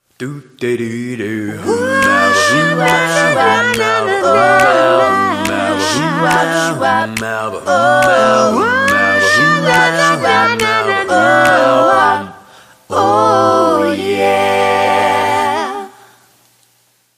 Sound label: malt shop acappella